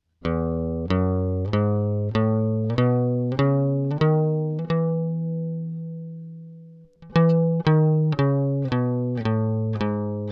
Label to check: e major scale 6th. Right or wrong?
Right